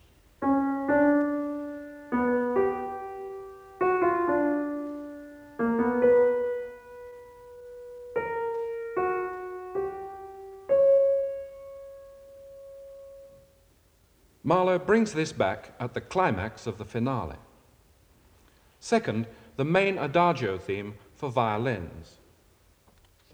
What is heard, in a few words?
Music Example (Piano)